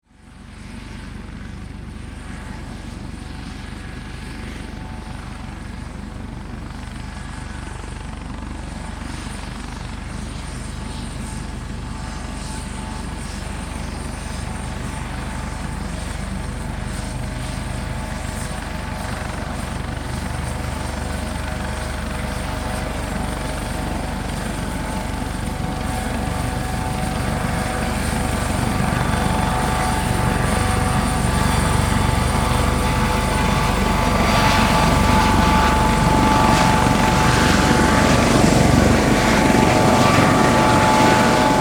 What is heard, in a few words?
Helicopter Taxi By, Aircraft, Helicopter, Taxi, By, Hh-65, Dolphin; Transportation; Helicopter Sound Effects